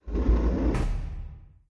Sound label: UI LEVEL CLOSE; Dino Shift 2